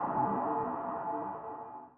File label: sonar Tail Air Close Shuttle Directional; Barotrauma; Real Sonar